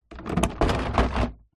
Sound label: household plant pot large plastic drag on hard ground